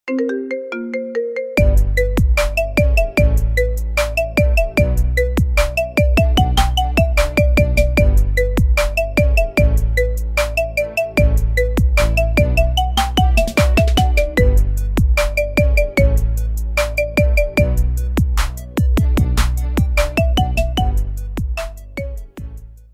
Marimba Remix

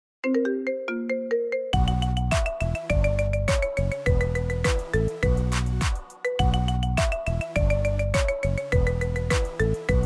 Marimba Remix

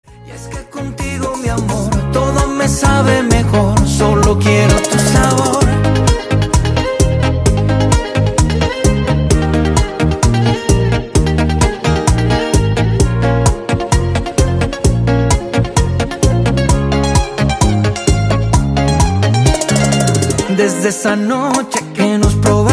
Bailando Bachata

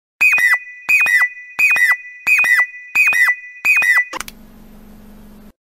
Siuu Twitter; Cristiano Ronaldo

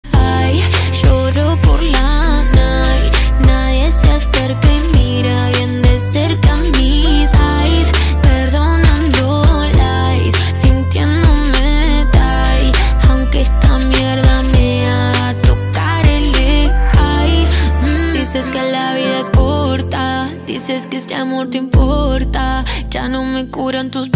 Remix

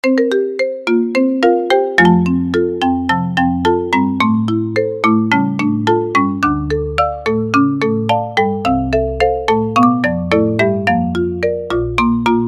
Marimba; Littleroot Town; Pokemon Ruby

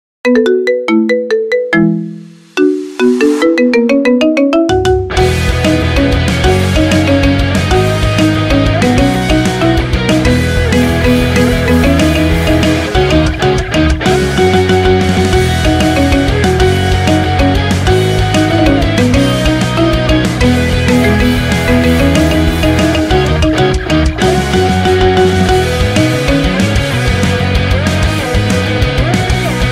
Marimba; Haikyu

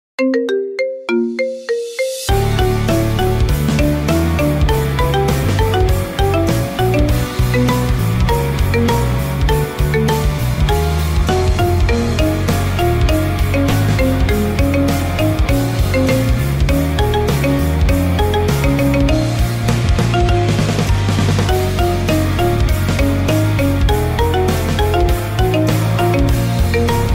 Marimba; Tokyo Revengers

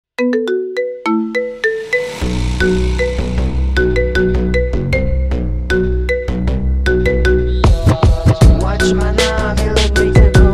Marimba

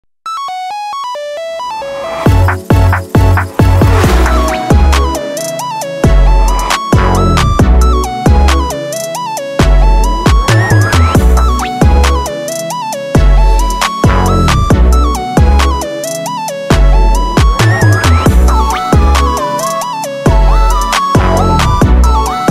Nokia PLUGGNB x NEW JAZZ

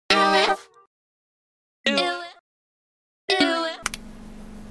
Uwu but phones OPPO

